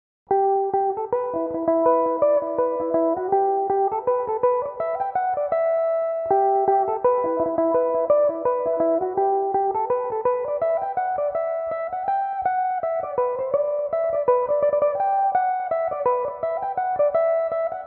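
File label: E minor, Irish mandolin; Gan Ainm 7; Reel (4/4); Belmuse EM-190 electric mandolin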